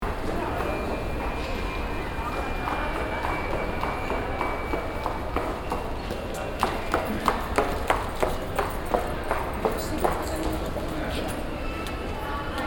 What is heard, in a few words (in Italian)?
Scale